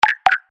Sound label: bip alert message